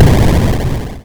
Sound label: jet die